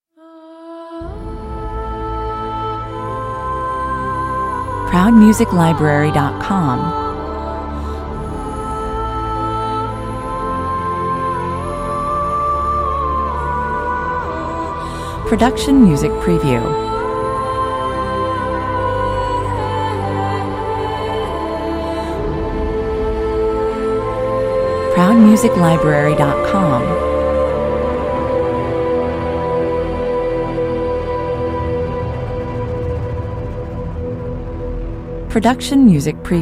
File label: no percussion